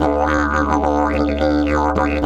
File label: 108, Didgeridoo